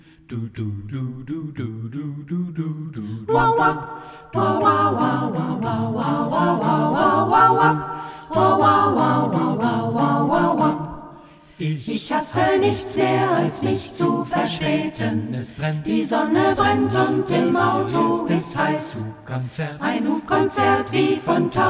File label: Mambo